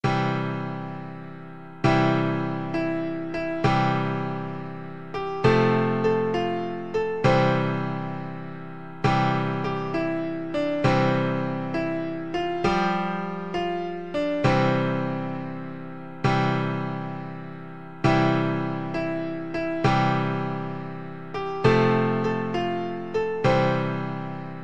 o holy night easy, piano

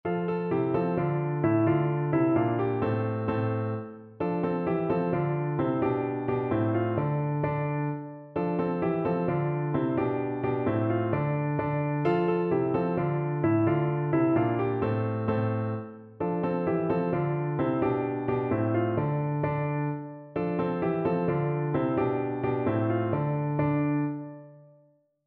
riu riu chiu, piano

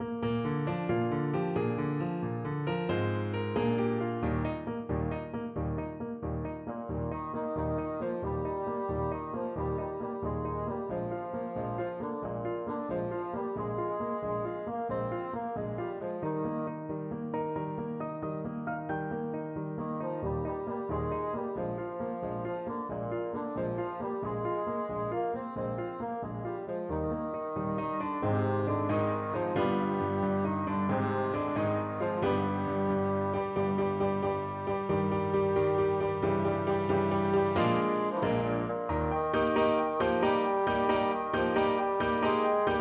ocean wave, bassoon

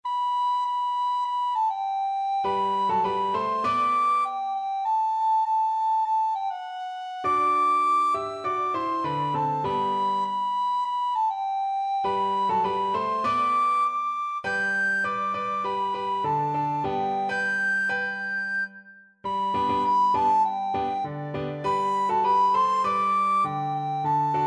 brown girl recorder

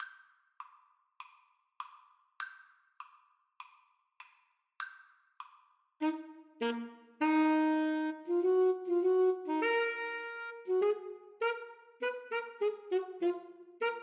L Arlesienne Prelude A SAX duet

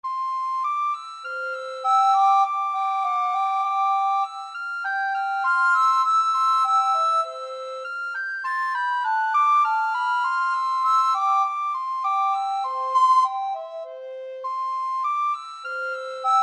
recorder duet

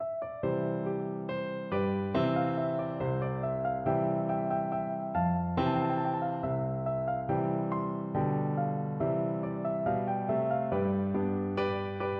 PNO

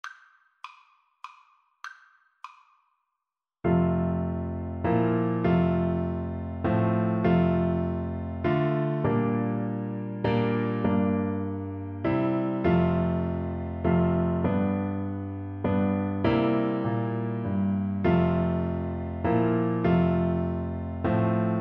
away in a manger, Tuba

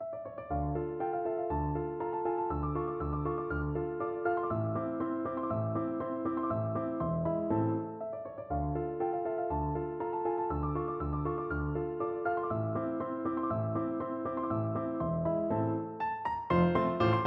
turkish march piano duet

